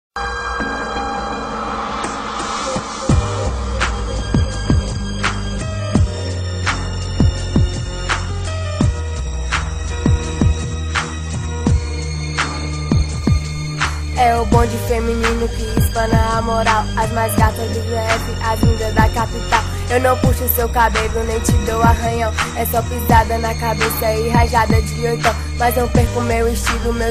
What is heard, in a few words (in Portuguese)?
bonde feminino; irajovemgamabondedoentorno